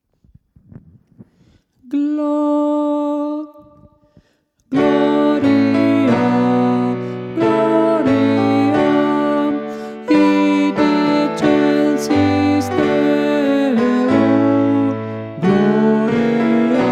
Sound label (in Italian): gloria di lourdes c. alto